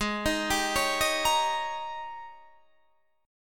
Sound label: G sharp Major 7th Suspended 2nd Suspended 4th, Guitar, Standard; arpeggiated; chord; 16,18,17,18,16,18